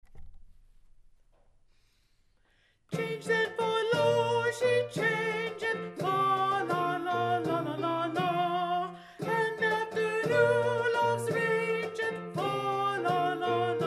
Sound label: SLOWER Alto; Change Then, For Lo She Changeth-Slow, W. Holborne